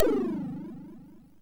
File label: Fuzzball, MOS6581R3